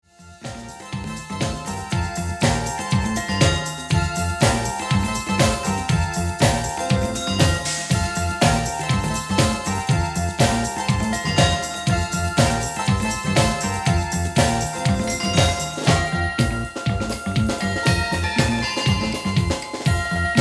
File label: Orchestra; Central-Orchestra